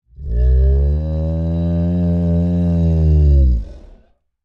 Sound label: Creature Large Moan Rumble Gutteral; Monsters; Sound Effects:Horror; Big Room Sound